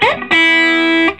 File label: SOLO E, Vintage Blues Guitar